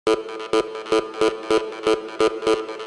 Lead Vital Psytrance

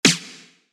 Snare One Shot

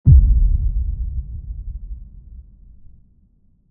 Impact Trance

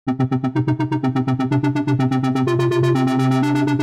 Arp Melodic Techno Serum

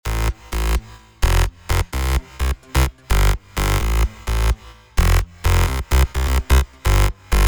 Bass edm Spire

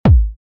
Kick Techno House; EMP

